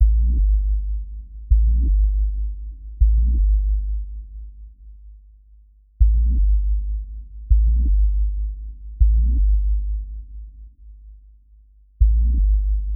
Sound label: Ambiant; Platformines